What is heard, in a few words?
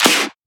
Shifted Clap; FLPRO